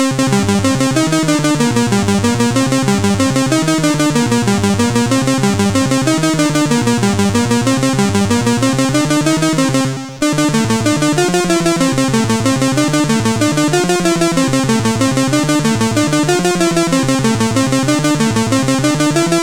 CSG8580; Square-Scape

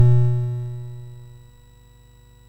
Frantic, MOS6581R4

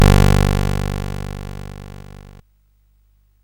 Frantic, CSG8580R5

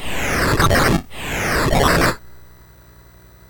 BASIC sid MOS6581R4, GAMES; Sword of Fargoal

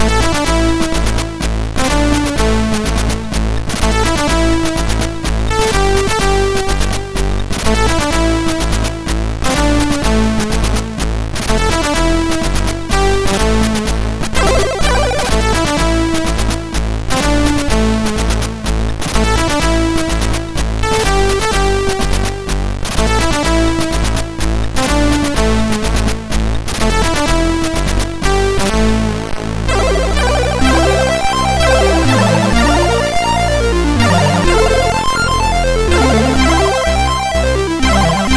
Musicbox, sid MOS6581R4

